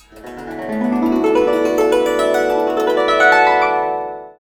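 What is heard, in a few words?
guzheng; Spectrasonics; Heart of Asia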